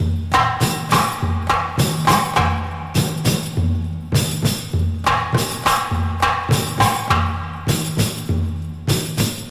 101 bpm drum beat f key, Free drum groove - kick tuned to the F note. Loudest frequency: 890Hz